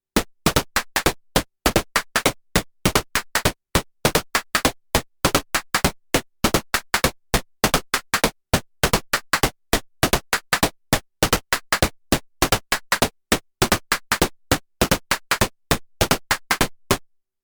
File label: Moog Subharmonicon Patch Lo-Fi Hi-Hats